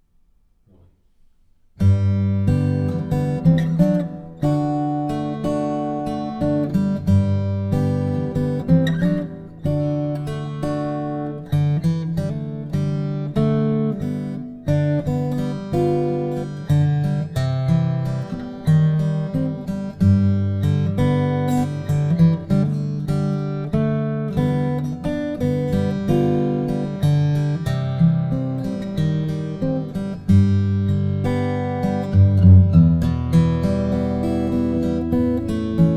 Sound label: solo acoustic guitar; Singer